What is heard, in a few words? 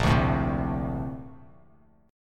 A sharp Major 9th, Bass Standard; strummed